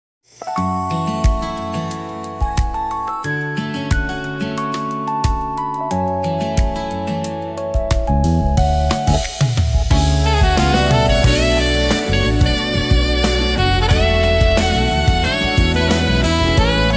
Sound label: easy listening